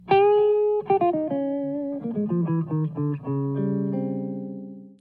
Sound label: Riff